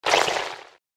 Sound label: App Game - Sticky Splat